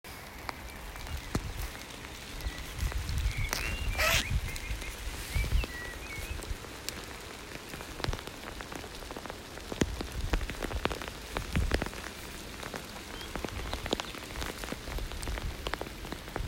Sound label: Rain Zipper Birdsong